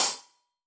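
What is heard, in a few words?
Half Hat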